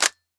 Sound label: sg553 clip in, weapons; csgo